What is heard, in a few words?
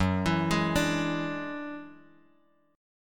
Gb 7th Suspended 2nd Sharp 5th, Guitar, Drop D